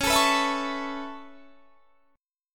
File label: Db Minor 7th Sharp 5th Db down, chord; strummed